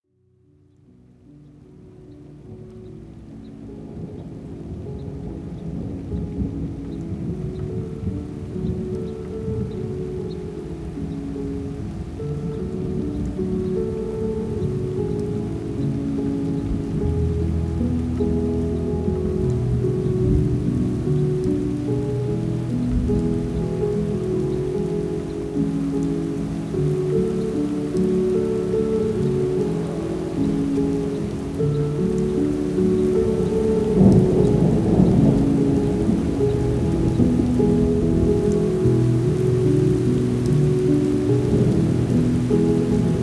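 Rain Noise And Thunder Sound - Relax Under Rain Noise And Thunder Sound; Relaks Pod Shum Dozhdia I Zvuk Grozy. Shum I Zvuki D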